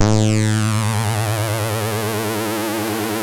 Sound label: Synth and Organ